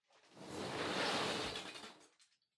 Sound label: Loose Fill Packaging Far Take Out Contents; 梱包材